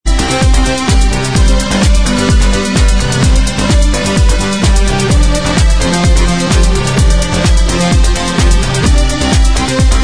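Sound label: dance remix instrumental track, backing tracks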